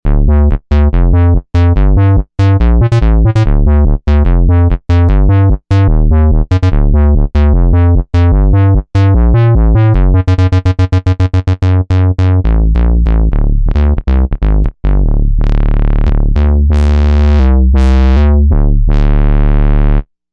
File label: Badger Bass; Novation UltraNova; Factory Patches